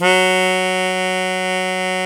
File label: HARMONICA